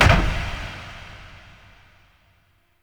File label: Vaults Cylinder Start; Entrati